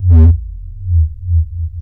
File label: Bass Lick, Drum & Bass; Fluid Dynamics